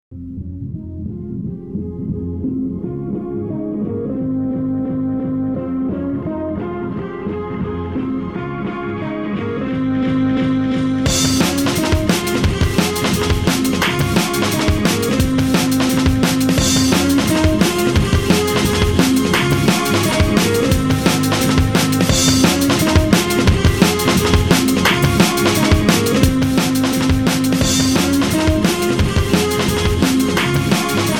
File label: trance